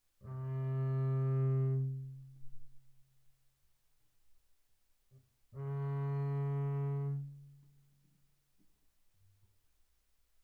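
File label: Bass arco sul D mezzo forte C3 B3, double bass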